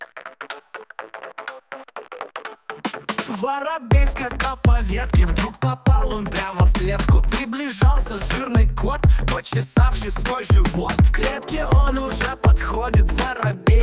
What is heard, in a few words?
pop rap, house, techno